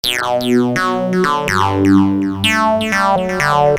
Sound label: melodic vintage synth; esoteric